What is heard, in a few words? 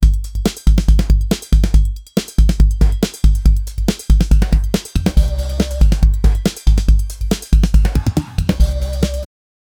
chopped samples in the st, electronic